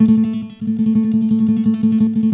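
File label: classical pick, guitar; Greytsounds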